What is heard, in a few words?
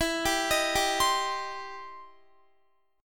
E Minor Major 7th Sharp 5th E down Guitar; EmM7#5 arpeggiated